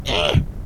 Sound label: "Ow", Grunz